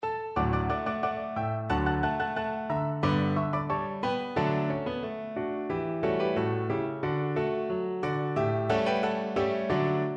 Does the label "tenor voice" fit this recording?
Yes